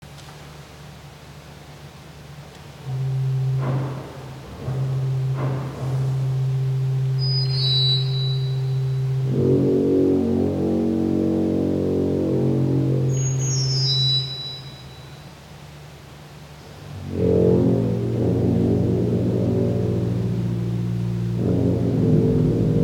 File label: improvisation; konzert; nurnberg